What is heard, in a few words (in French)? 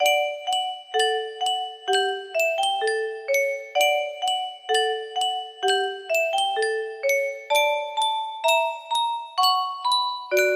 yunsheng boite a musique, music box melody